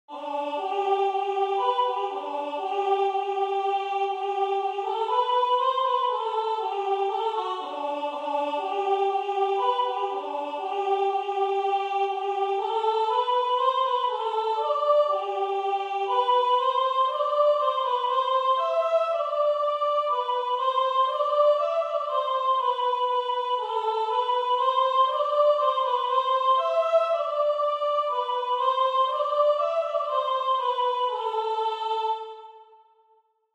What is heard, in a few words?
Sopraan; t Is geboren